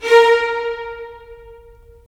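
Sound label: strings violins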